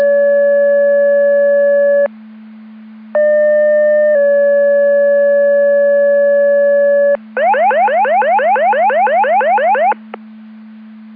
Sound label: Siren; Andover Twp Boro